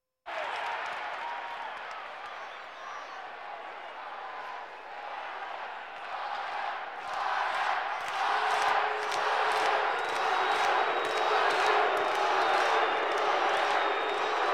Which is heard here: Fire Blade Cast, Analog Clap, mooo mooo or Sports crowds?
Sports crowds